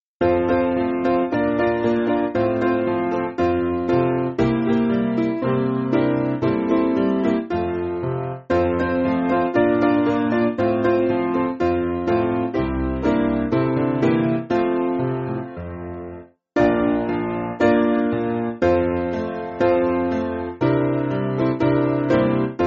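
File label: Piano, Backing Files for Churches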